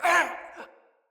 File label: Death, Soldier Male; Battle; Barks; Dialog; Dendrit